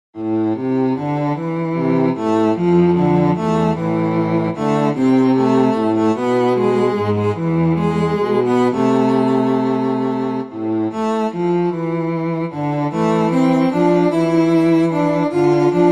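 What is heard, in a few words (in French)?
petit duo en la pour violoncelles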